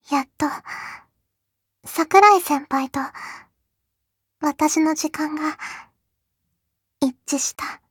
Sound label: applause